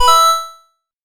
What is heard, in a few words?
Coin 200bpm; Mario